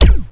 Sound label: laser; Starsiege